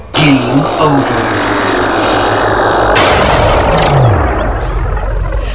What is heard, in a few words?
gameover